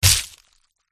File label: attack contact; lyubimka